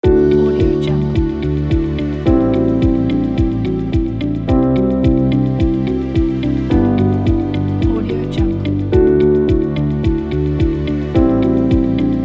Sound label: advertising background loop; loop box